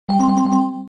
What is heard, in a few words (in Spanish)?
tik tok mensajes